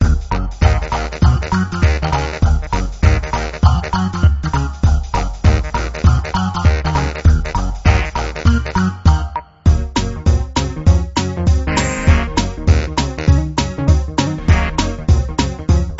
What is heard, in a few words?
retro full; Gutterball 3